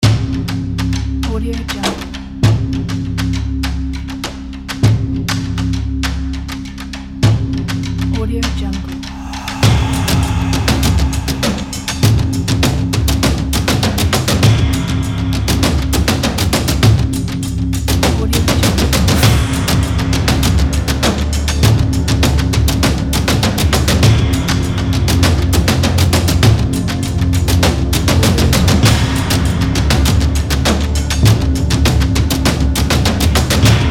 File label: percussion epic trailer